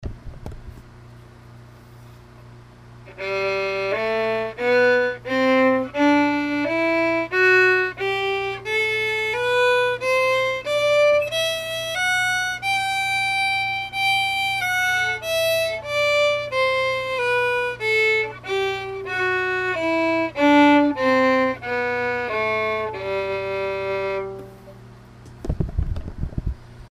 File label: slur shuffle